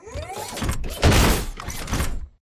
melee jump stomp forward; Titanfall 2

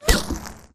imp bullet lightballistic impactsweetener

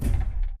footstep jog; Titanfall 2

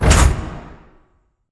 sliding door sm verb close stop; beacon; Titanfall 2

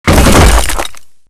concrete shotgun bullet impact; titan; Titanfall 2

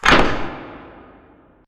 Robot Arm Track Start Close; Boomtown; Titanfall 2

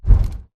bt footstep jog parts; Titanfall 2

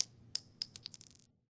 9mm shell drop; Titanfall 2

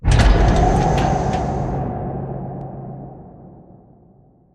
Giant Floor Panel Arrive Start; Boomtown; Sideways Town; Titanfall 2

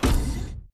footstep jog close; Titanfall 2

